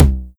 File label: Tom Low TR909